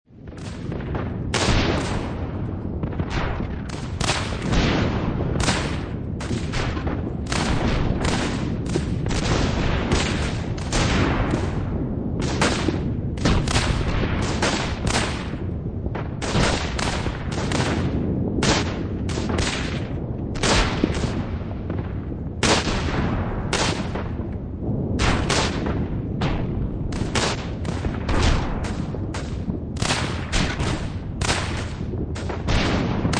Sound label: Warfare World War One Battlefield Guns Explosions; WWI